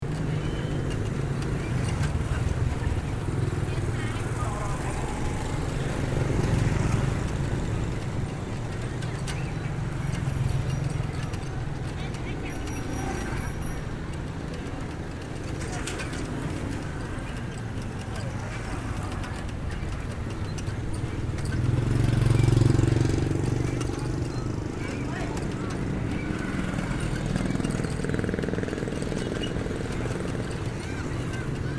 cyclo home, cambodia

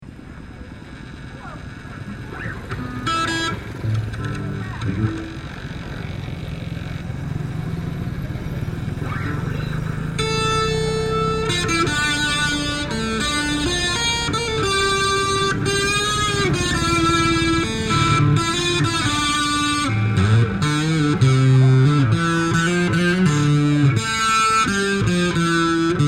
Muang Sing noodling, laos

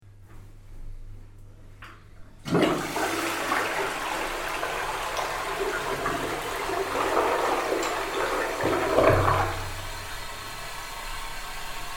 plumbing resonance, laos; in a hotel in a town in the north; sounds of plumbing and irrigation / pipes

